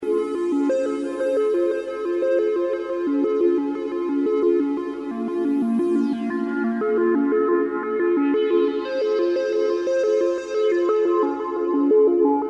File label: arp loop